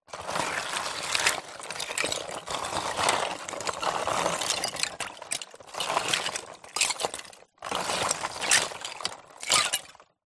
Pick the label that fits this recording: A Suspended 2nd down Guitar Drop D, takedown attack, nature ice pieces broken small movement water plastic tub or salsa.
nature ice pieces broken small movement water plastic tub